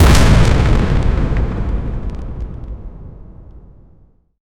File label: a heavy sci-fi explosion